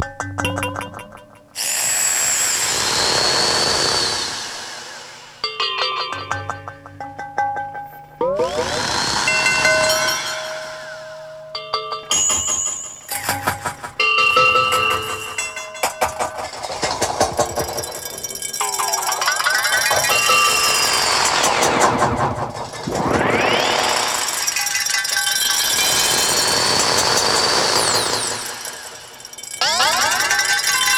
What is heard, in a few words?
Under Our Piano